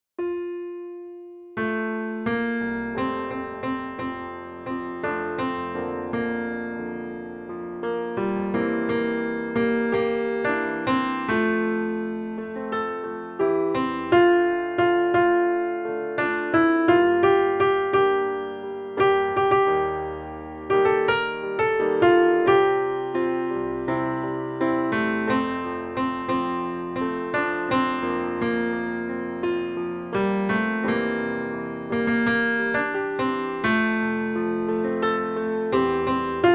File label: Melody; Storyteller; FOLK